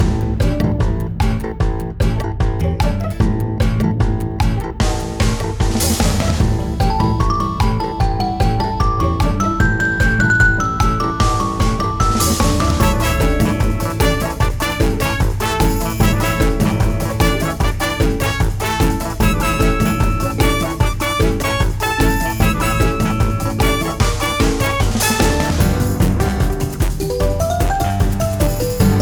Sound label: Monkey Jam FULL Loop; assault on metaltron ps4 switch windows xbox one gamerip 2019